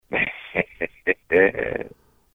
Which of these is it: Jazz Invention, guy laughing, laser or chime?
guy laughing